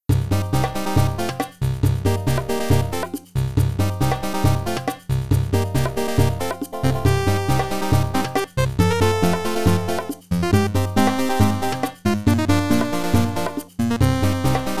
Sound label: 8bit; Hyrule Warriors Definitive Edition